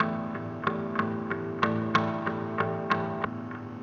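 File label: electric guitar, ethno, 125, A; eJay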